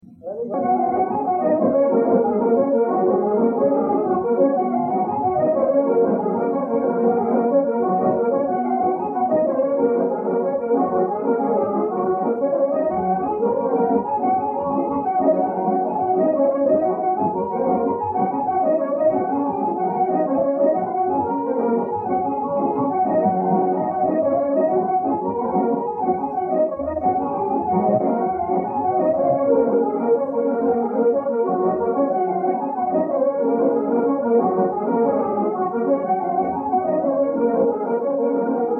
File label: Munster Buttermilk jig